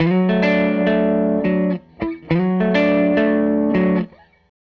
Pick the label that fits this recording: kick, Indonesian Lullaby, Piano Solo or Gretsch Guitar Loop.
Gretsch Guitar Loop